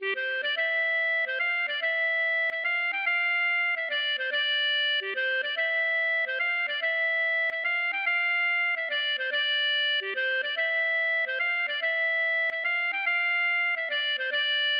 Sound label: mazurka